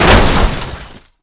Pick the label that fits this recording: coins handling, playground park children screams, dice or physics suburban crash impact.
physics suburban crash impact